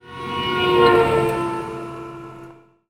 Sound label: car passing horn